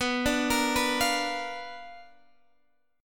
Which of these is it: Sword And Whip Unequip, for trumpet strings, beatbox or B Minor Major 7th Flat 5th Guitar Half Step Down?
B Minor Major 7th Flat 5th Guitar Half Step Down